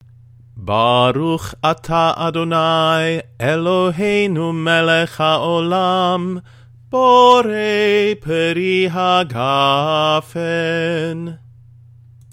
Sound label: Kiddush